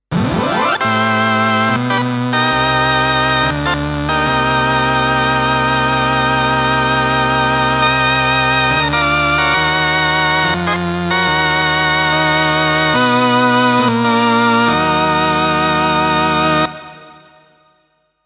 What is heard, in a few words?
farfisa